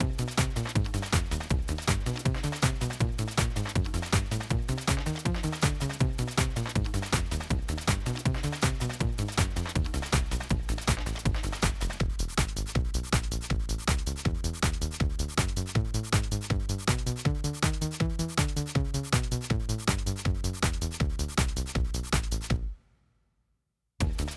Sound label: Space Chill